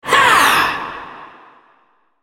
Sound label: spellcast effort; Syndra